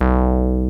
303 MEL, BASSES; Pro-Rec - Synthsonic

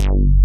PANIC BS, BASSES; Pro-Rec - Synthsonic

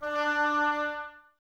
OBOES D, Woodwind Brass Ensemble; Miroslav Vitous

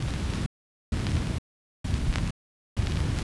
Rythmic Noise 130BPM

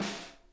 VINT KITS; Sonic Reality - Vintage Timetraveler

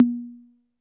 D3 Analog Tom, Dance Kit

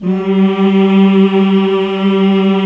MALE HUM G2, ENSMBL; AKAI S-Series CD-ROM Sound Library VOL-8

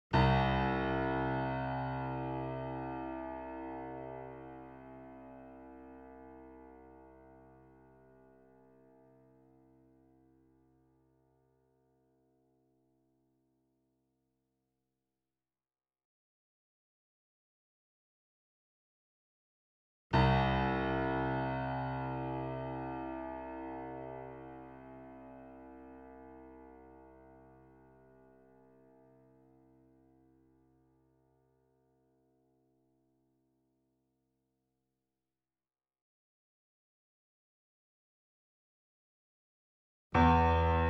C2 E2 A2 Grand Piano & Effects; Sound Sampling Collection VOL-3; Grand Piano 88

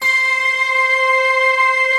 HARPS, Harpsiflute, Harp Flute Stack; Optical Media International; Sonic Images Library

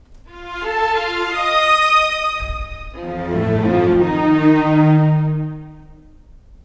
Cinematic Strings, Orchestral; Cinematic 06; Orchestral Series

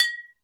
Lo Bottle, Drum Machines Alesis D4

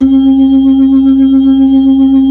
B-3, Blues; Keyboards of The 60's and 70's

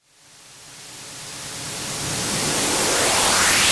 FX Sweep Up

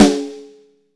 METAL, Snare; BRASS SNARE; AKAI S6000 CD-ROM - Volume 3

